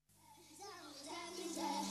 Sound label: Base Reggae